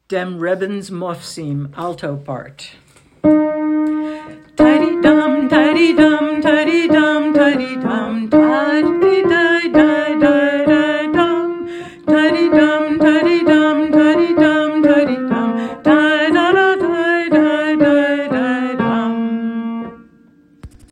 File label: alto part; Dem Rebns Mofsim; mofsim chorus